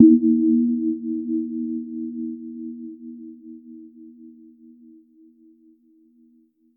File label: a subtle soothing ball dr, minimalist